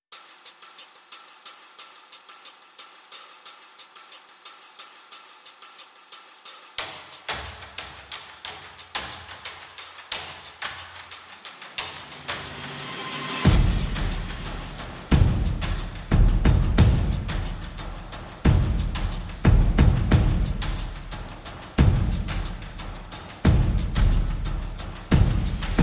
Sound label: Perc and FX